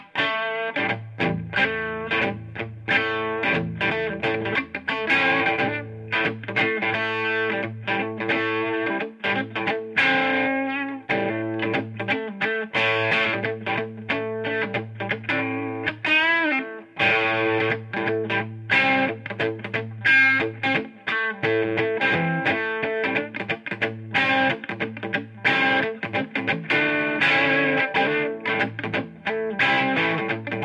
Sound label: Noodling, Distortion